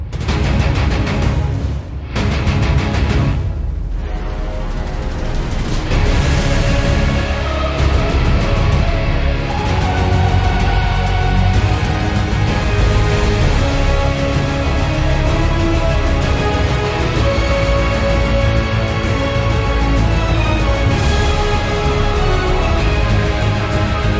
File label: Orchestra